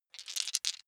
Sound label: big three rattle in hand short